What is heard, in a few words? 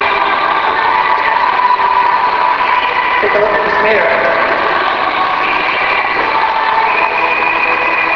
spectra laser test